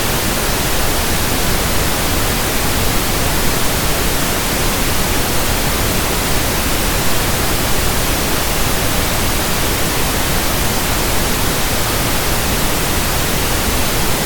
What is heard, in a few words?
pink noise, test tones